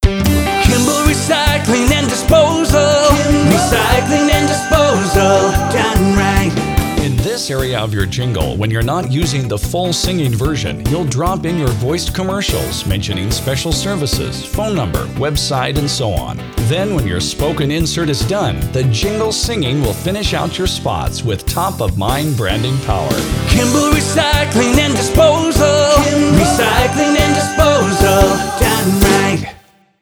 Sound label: jingle, recycling company jingles; Kimble Recycling Disposal